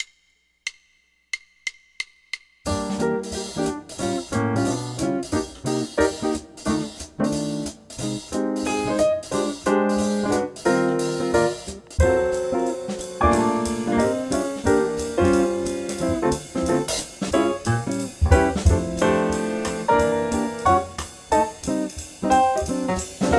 swing bass play along, jazz; LMEA All-State Jazz

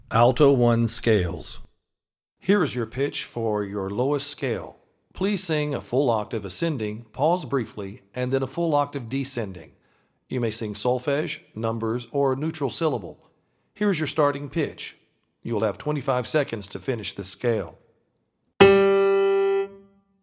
Alto Scale Audition

